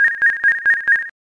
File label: Beep